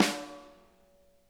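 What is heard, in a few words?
center, snare; Sonic Reality; Interactive Drum Kits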